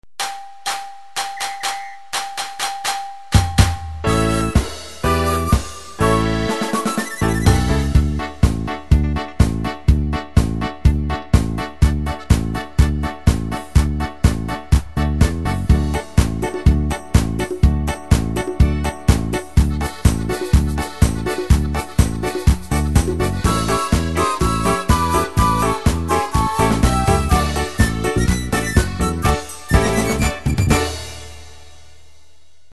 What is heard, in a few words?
MarschFox, KORG Styles